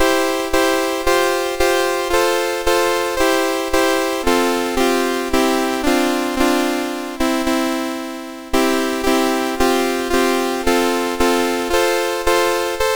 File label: Chord Progression Piece, MOS6581R4